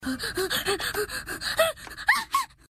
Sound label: short breath